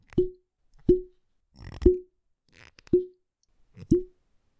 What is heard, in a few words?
Bottle Open